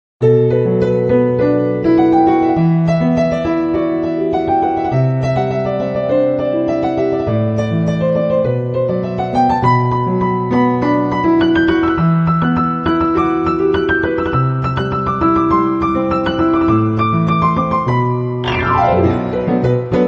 Piano

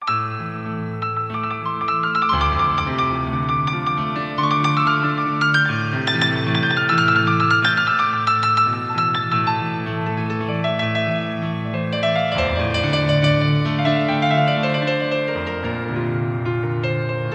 Piano

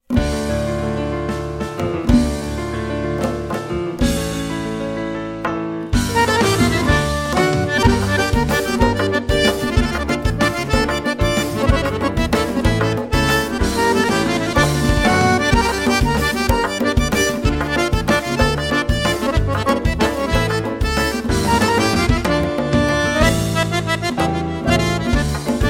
Accordion

